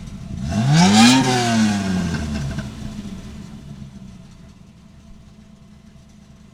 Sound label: IDLE, auto; trasporti